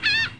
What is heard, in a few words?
chat whisper